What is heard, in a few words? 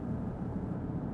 room; Mafie 1